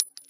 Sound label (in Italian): bullet cade, armi